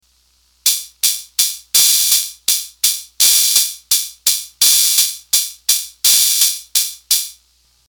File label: pad pedal, dr110